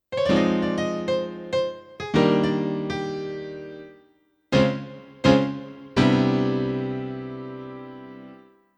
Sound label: Startone MK 400 Bright Piano